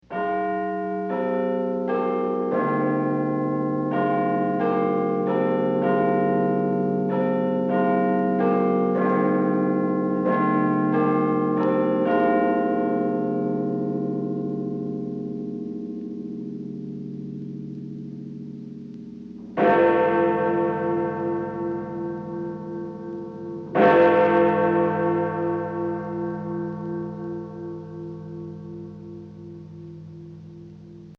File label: Tower Bell Two OClock; Bells; Musical